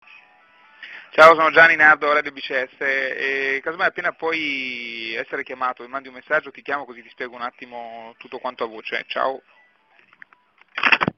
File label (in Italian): jingles